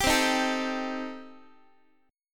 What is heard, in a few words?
C Minor 6th Guitar, chord; Cm6 strummed; JGuitar